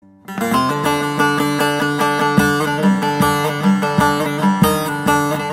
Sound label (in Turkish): baglama